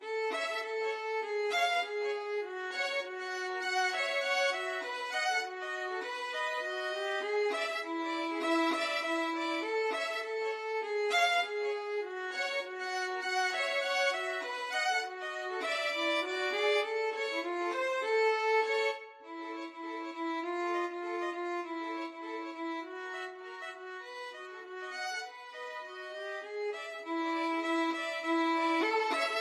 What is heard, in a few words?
silver sand rag violin duet